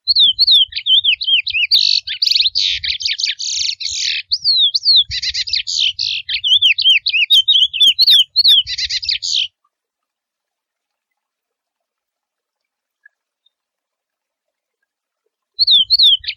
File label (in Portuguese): Canto de curio selvagem mateiro